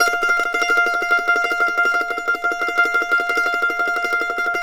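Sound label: mandolin tremolo F5; Sampleheads; Whole Lotta Country 1 & 2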